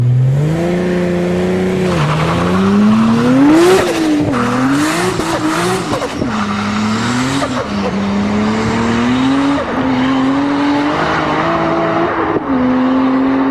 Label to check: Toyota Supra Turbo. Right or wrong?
Right